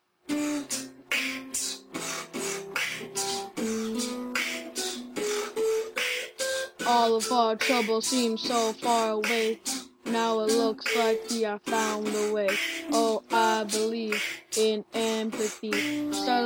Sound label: Beatbox